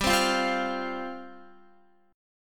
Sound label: G 7th Suspended 4th G down Guitar